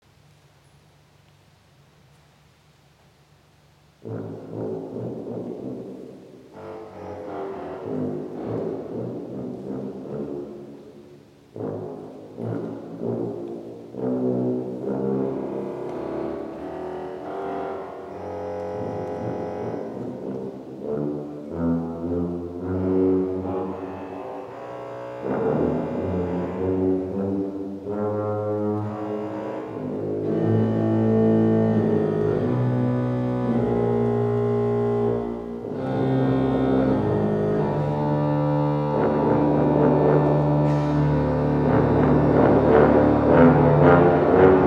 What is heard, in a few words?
improvisation; konzert; nurnberg